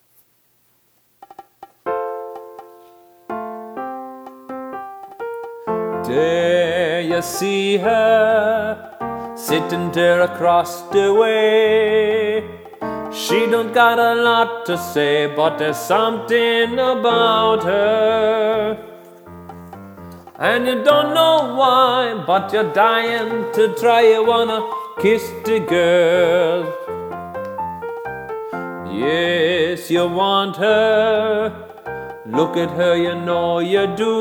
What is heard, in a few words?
Ensemble vox